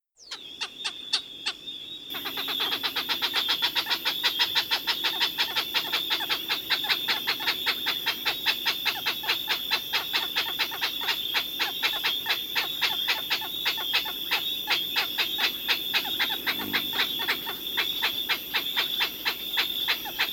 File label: Galago senegalensis, Senegal galago